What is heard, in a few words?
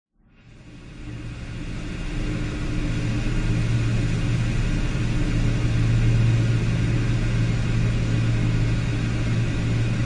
industrial factory machine room ambience loud constant machine hiss air; zapsplat